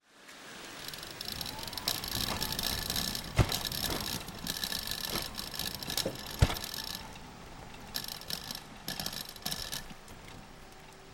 E-Scooter Electric Bike - Indoor Parking Garage - Start to MidSpeed to Stop - Bumpy Ride, Binaural; Spot Tire Mics Only; The Chris Alan